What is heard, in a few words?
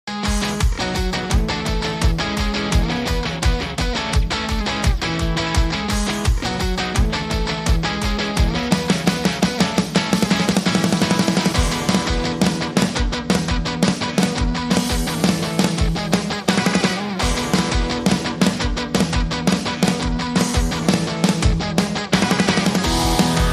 No Bass